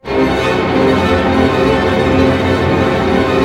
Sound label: STR ARP, Symphony Orchestra, STR Arp. Diminish; Roland LCDP08 Symphony Orchestra; STR Arpeggios